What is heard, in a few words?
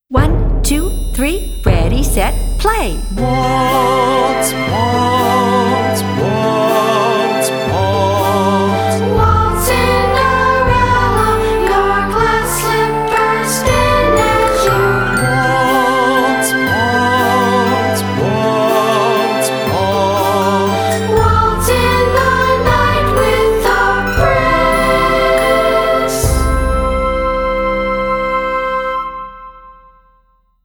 waltz; cinderellas